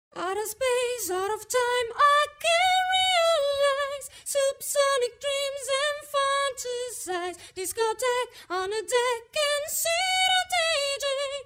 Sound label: Vox up fifth env